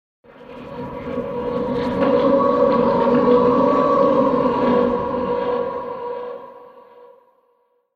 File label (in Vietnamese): tieng keu cua nhung hon ma duoi nuoc